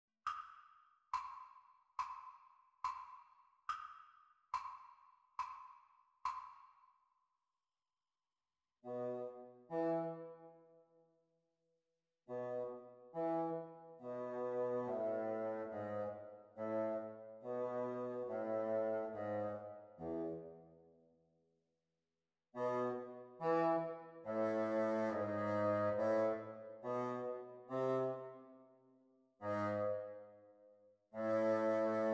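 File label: bassoon duets practice speed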